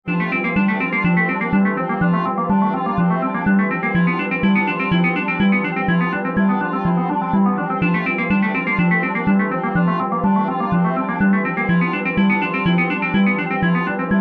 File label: guitar 124bpm